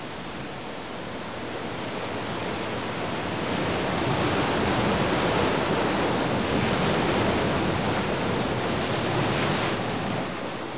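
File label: ambient ocean; Renaissance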